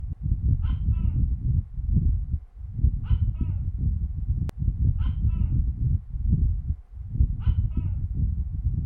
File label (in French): Le Geko, Thaïlande, Ko Samet